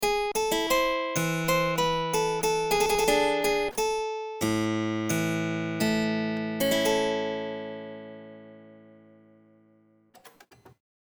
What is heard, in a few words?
cadence Dry